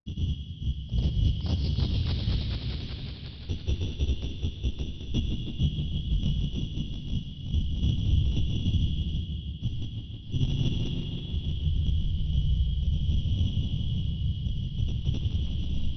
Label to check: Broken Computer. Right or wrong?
Right